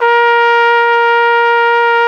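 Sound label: FLUGELHORN B; Best Service; Alpine Volksmusik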